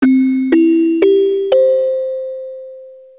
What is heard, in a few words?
broadcast